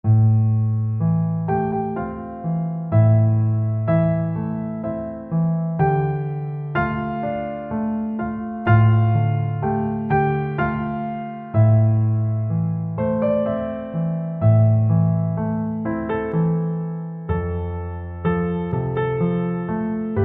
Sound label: Piano